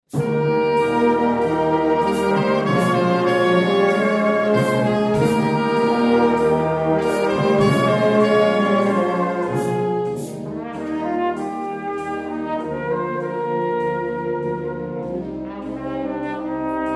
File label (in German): flügelhorn; badhall